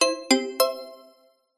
multimedia alert notification mallet musical short positive